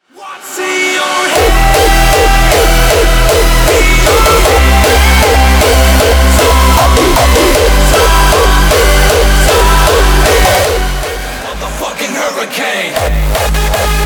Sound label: hardstyle